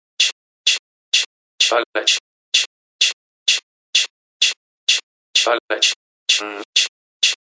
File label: hat loop with vox; Ze Franks Pain Pack